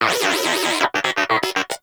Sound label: Moa Inquisitive Vocal Player Down; Pets; Warframe